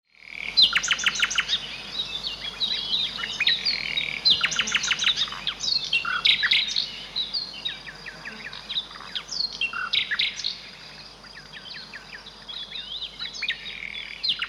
rain forest birds insects

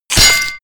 sword impact gore

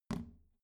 plastic trash can close soft

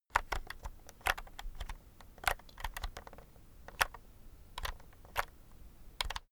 keyboard external game sequence short

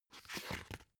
dvd case put in booklet

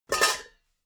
pots stack

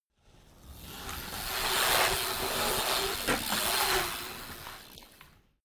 bmx maneuver inside bowl

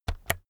keyboard external key press slow

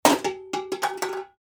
paint tin falls down

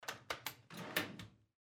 kitchen noise

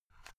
watch case small open soft

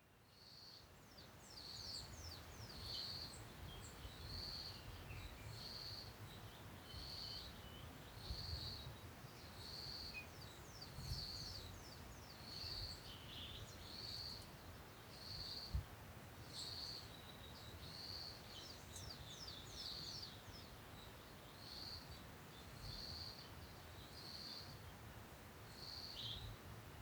african bush morning

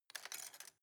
cutlery plastic drawer place soft

